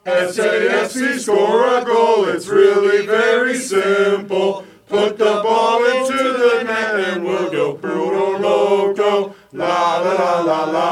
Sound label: score a goal, fanchants; safc